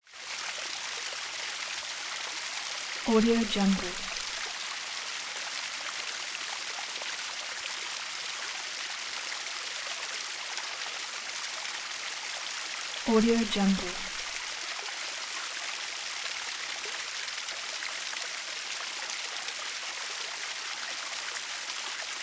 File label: waterfall active brook lake district england